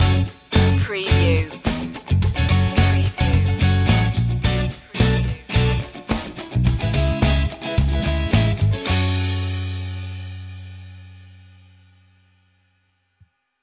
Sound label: disco funk stinger